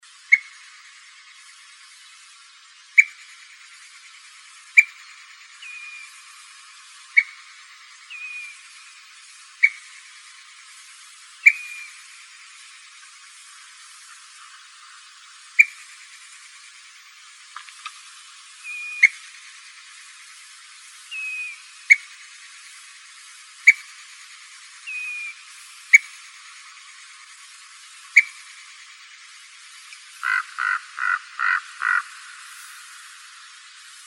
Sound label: CALLS, Flycatchers; AZFO; deviche